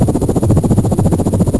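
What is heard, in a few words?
helicopter; World Racing; XBOX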